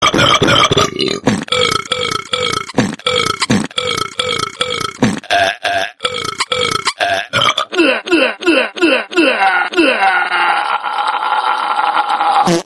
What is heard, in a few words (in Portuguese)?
Sequência De Arroto Peido